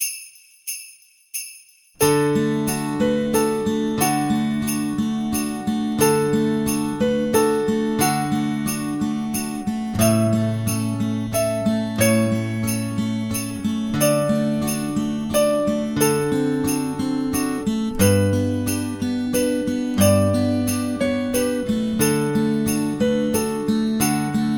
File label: silent night C arp, guitar